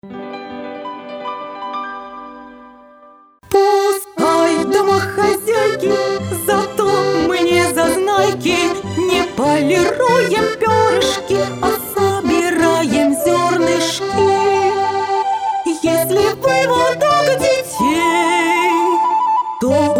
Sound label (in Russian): kurica s vokalom